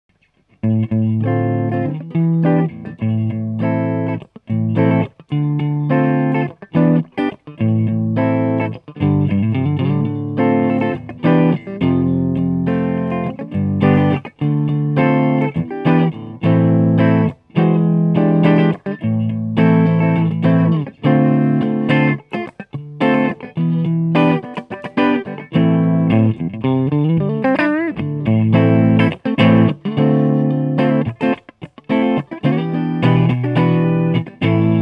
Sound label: clean